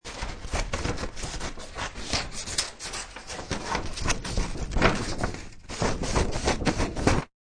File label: Messy Scissors Cut, Messy sounding scissors cut; Offices